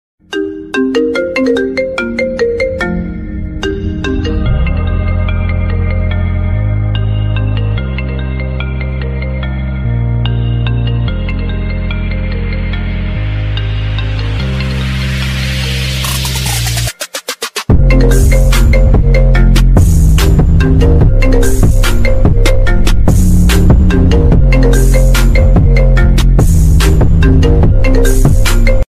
iphone pro max official ringtone

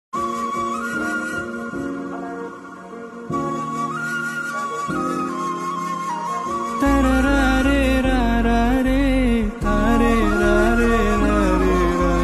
Tamil song ringtone

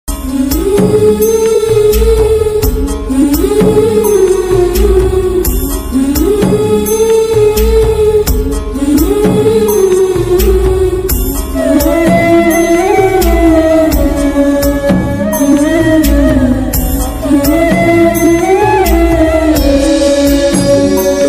i love u bengali film sad bgm

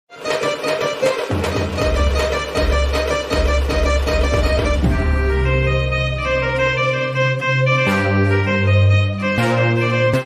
bgm; pudhupettai; dhanush; kokki kumar

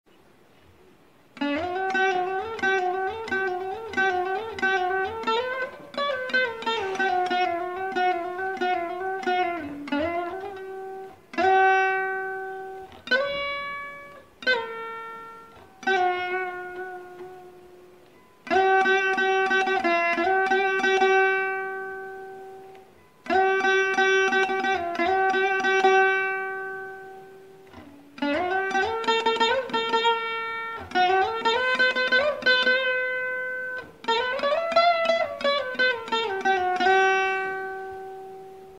bgm veena cover; chandramukhi

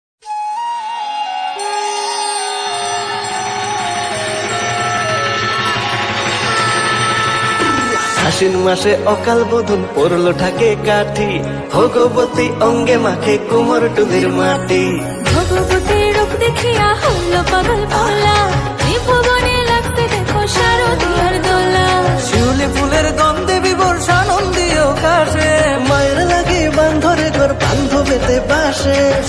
bengali ringtone song

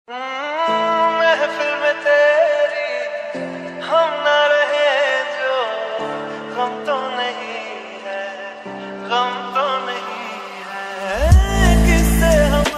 love ringtone musical beat

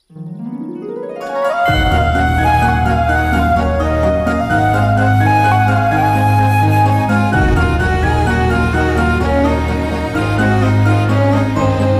love bgm ringtone; rohit and dia; dia movie

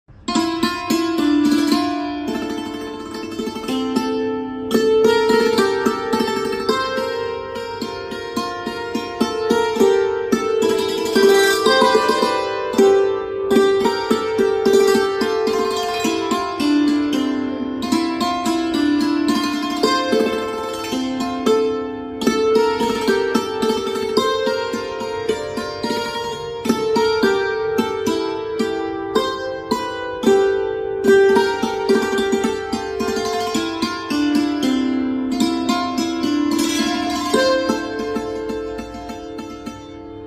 santoor live music melody instrumental; bollywoodmusic

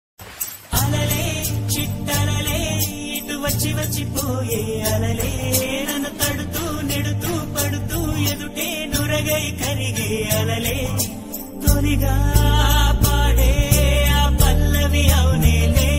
song telugu; sakhi movie

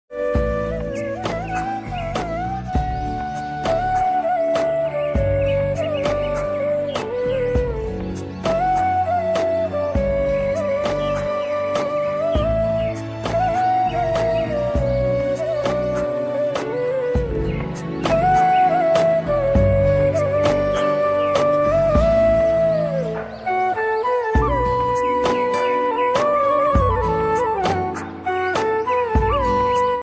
flute music ringtone instrumental bgm

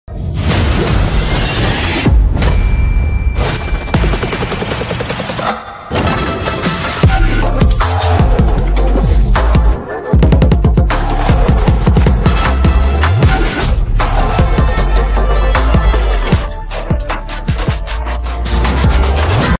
hero entry bgm ringtone; ram charan; dil raju

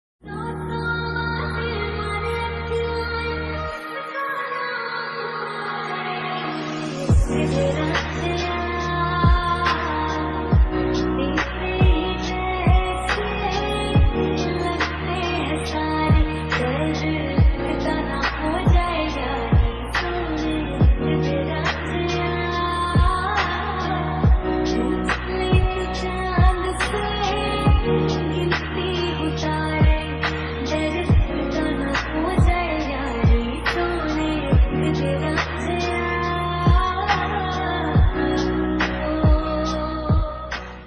best ringtone

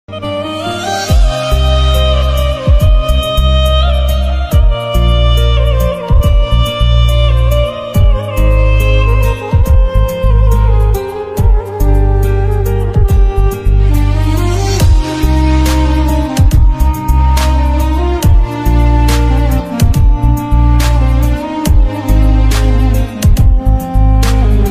sad ringtone

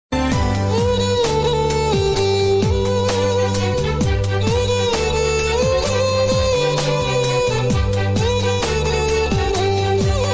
sad bgm

